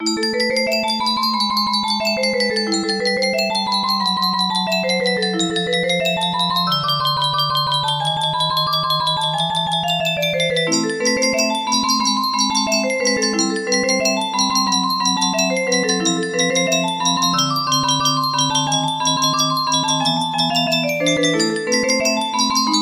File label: in A Minor, flowing forward, music box melody